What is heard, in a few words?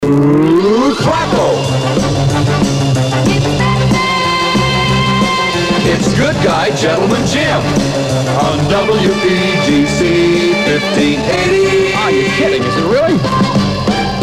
jock jingles; 1966; jim madison; batman